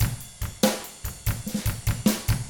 rock 6/8, drums; ILIO; Double Platinum Drums 1

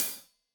Hat Loose, Hi-Hat, Cymbals Stereo

